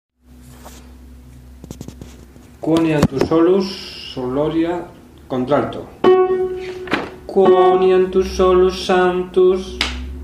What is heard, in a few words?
alto; Quoniam Tu Solus Sanctus